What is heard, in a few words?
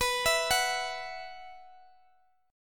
B Suspended 4th, Guitar, Open D